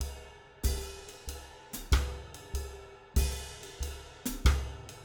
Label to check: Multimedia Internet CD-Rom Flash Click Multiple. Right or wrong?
Wrong